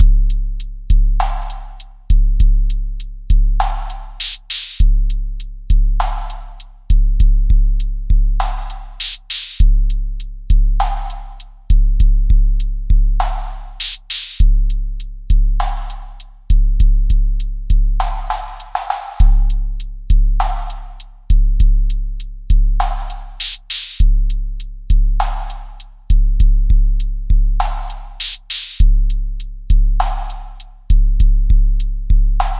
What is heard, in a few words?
100bpm Minimum, drumloops